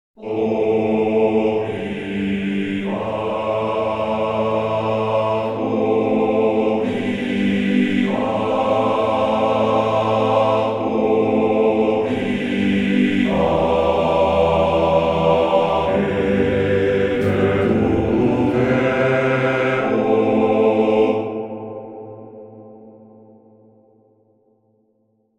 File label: Ambiente Choirs